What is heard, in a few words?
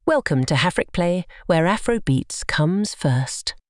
Jingle; Hafrikplay